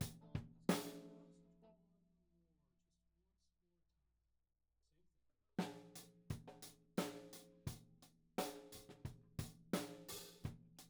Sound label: Tom1